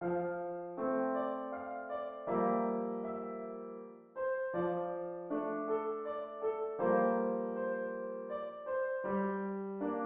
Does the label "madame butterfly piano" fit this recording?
Yes